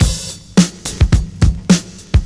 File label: 70s Breakbeats; USB Soundscan vol.46; AKAI